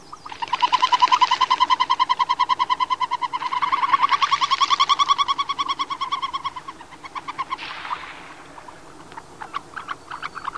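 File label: wing whistles & calls, Surf Scoter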